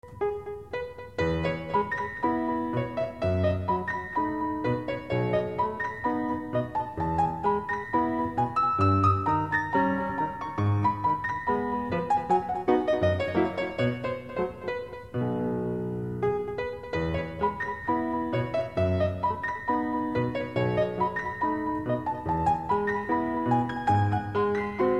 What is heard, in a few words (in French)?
piano, Musique Mécanique